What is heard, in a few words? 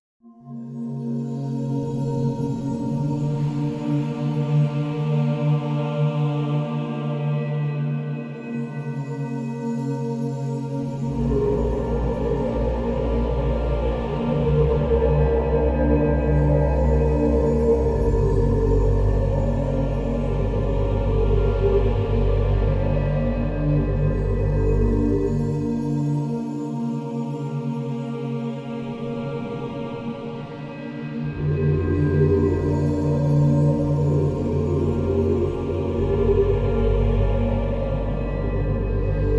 Menu Music; Hearthstone; Naxx